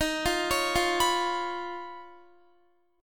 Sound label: Eb 7th Suspended 2nd Sharp 5th Eb, Guitar; x,18,17,18,17,19; Eb7sus2#5 arpeggiated; JGuitar